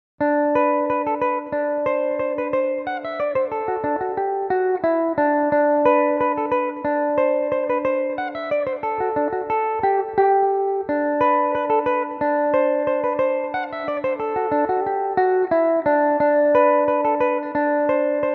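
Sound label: G major belmuse, irish mandolin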